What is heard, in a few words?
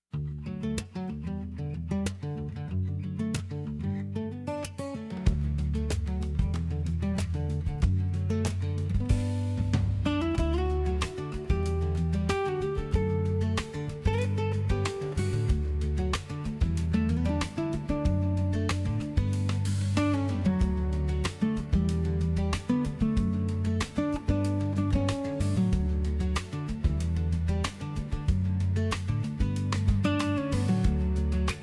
BGM Yosemite